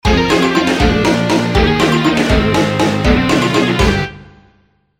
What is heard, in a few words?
fencing, music minigame; tf2ware ultimate